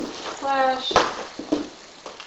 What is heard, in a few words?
Knock no response; Private Home Case 1 2015